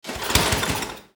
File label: gas snow blower false pull start